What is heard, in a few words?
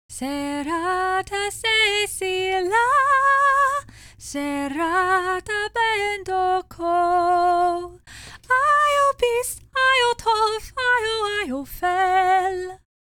sing